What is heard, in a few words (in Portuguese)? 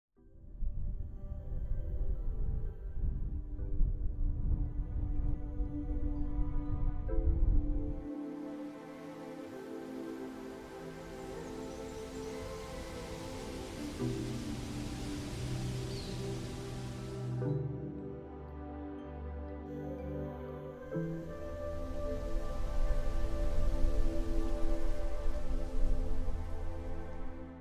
hip hop graves bass